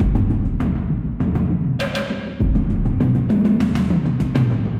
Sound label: Muddy Drums Kit - 100 bpm; MIDI Clips; Ableton; Factory Packs; Mood Reel